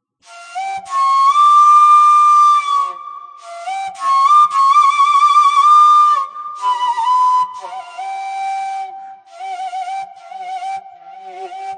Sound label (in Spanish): Flauta quebrada flauta